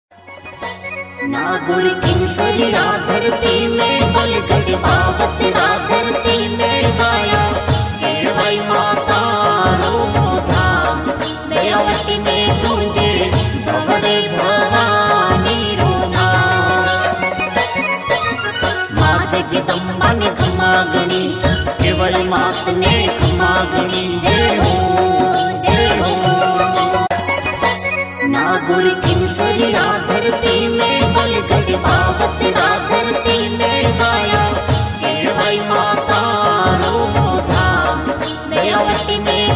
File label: Navratri Special Ringtone